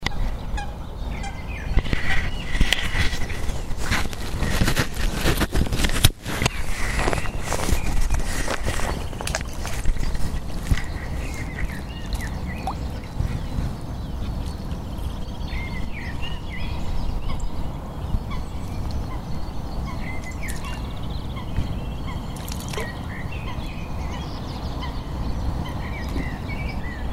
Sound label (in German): seegeraeusche